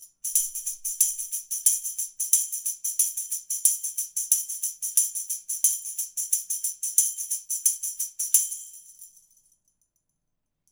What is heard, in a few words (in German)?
Schellenkranz